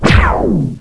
uber laser

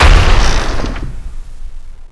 explode

